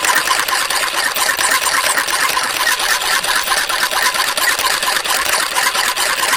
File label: Propeller; Angry Birds